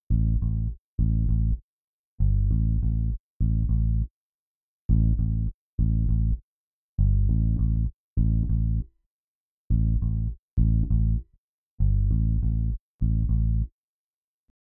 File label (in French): basse bloom